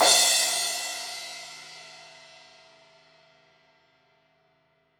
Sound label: Crash 16in edge, Cymbals; Deeply Sampled Drums